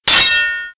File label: Sword Death; Playable Tutorial Level